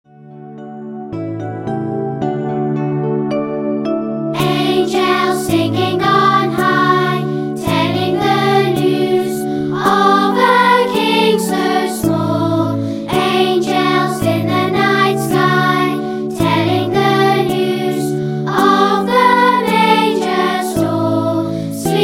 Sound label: Angels Singing On High